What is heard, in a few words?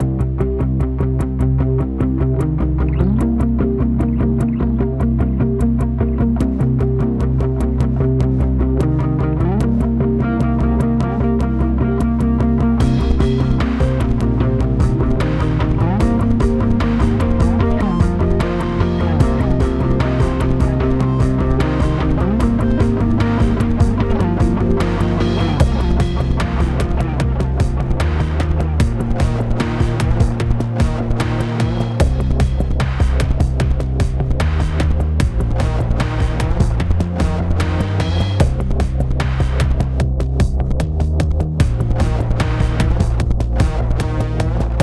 Music Post Race 75bpm; Forza Motorsport 6 Apex